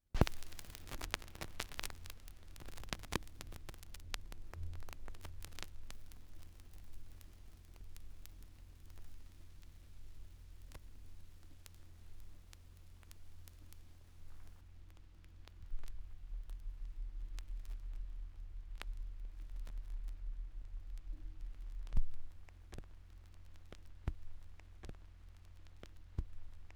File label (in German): schallplattengeraeusche